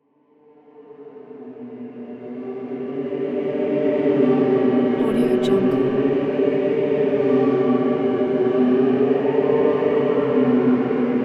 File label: scary ghosts